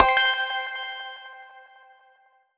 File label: UI Menu Confirm Medium